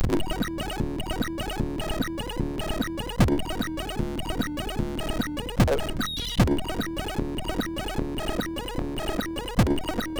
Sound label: Anthem, sid MOS6581R2; UWillRaise; hvsc